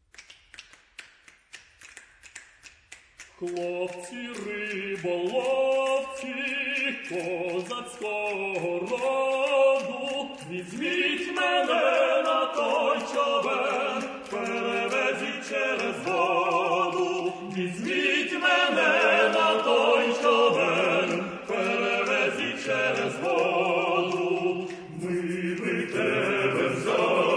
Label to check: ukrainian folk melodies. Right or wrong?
Right